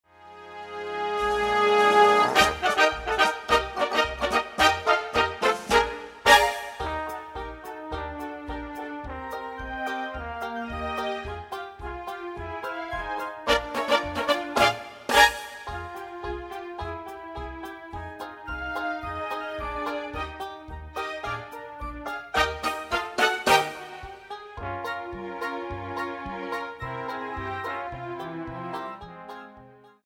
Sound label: in C